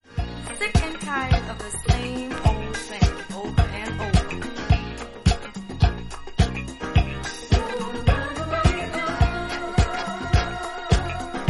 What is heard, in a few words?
disco funk soul